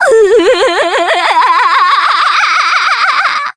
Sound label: cackle